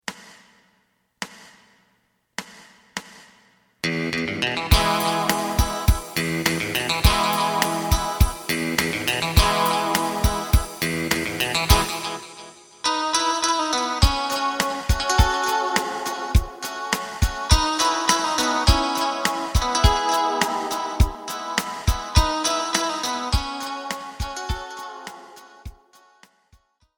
Blue Sunset bass

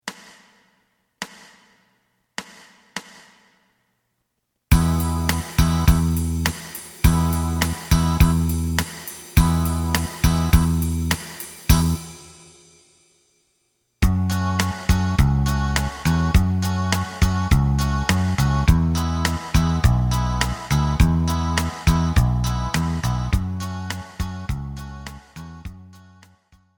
Blue Sunset lead

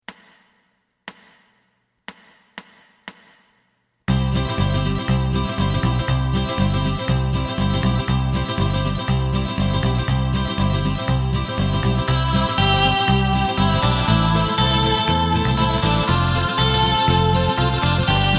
guitar drum

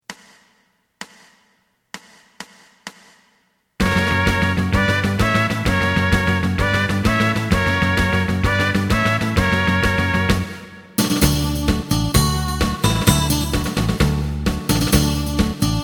guitar tango

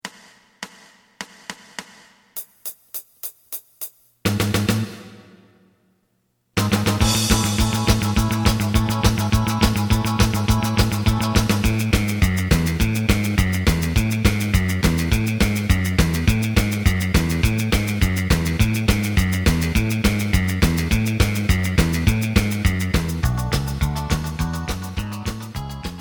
lead

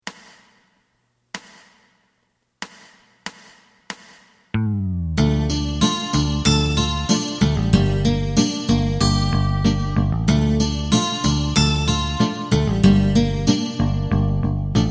drum

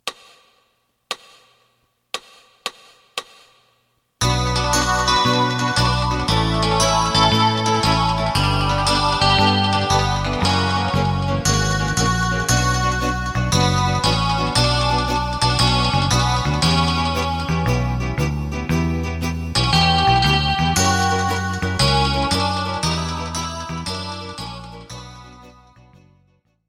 Bm drum

